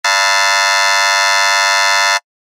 劇場やホールの開演ブザー 短め リバーブ無し; 演劇・舞台

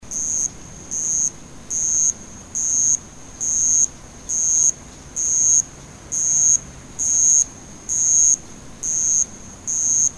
夏の虫; 環境音

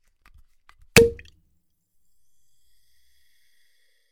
シャンパンの蓋を開ける音; alcoholic drink

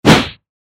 短剣 空振り; モノラル; 武器効果音

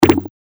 動かせないブロック; モノラル

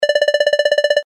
会話

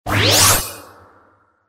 召喚魔法; モノラル

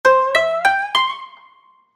場面切り替え 琴 和風

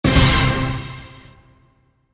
デデデン 逆

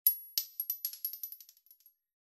薬莢

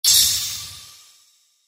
氷魔法 中 モノラル; 魔法効果音

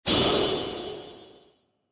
トランジション; 場面切り替えや心理描写; 演出効果音; 場面切り替え・トランジション

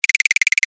ガタガタ震える 短め

